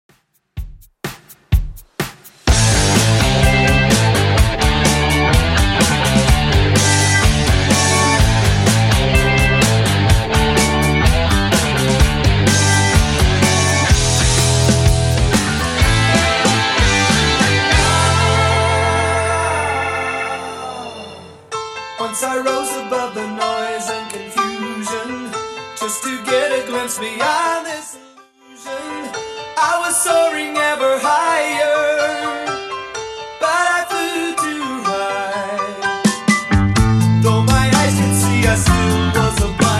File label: 70s Rock Redrum 126bpm